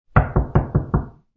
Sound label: A soft door knock; Knocks; Objects